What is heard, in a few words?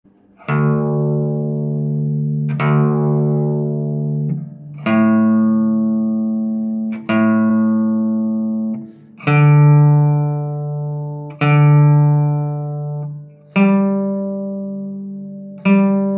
Guitar notes; نت های گیتار